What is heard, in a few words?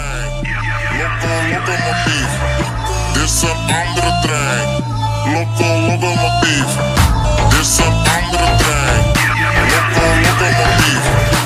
english remix ringtone